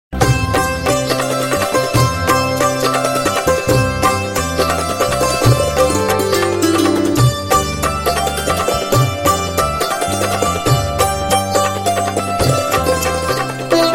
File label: SANTOOR TABLA SITAR